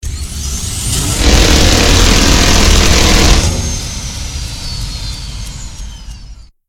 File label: Minigun